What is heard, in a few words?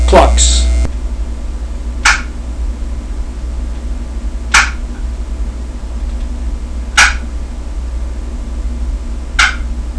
clucks, friction box; ww box; blk myst